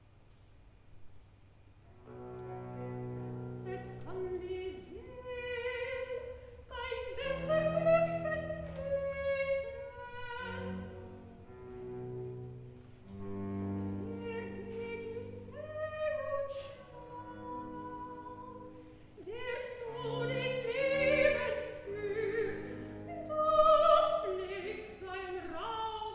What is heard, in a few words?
Recitativo Soprano; Johann Ludwig Bach; Die mit Traenen saen; Kwintessens